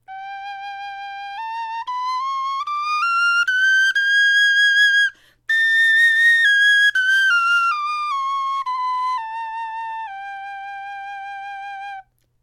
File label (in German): tonleiter, knochenfloeten